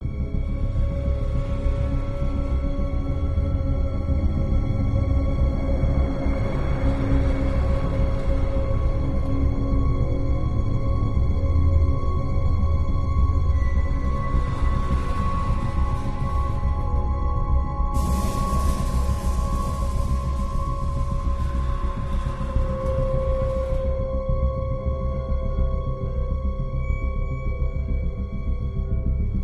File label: Loop Tense; the walking dead season one